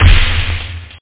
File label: Pop Snare; MarcDrive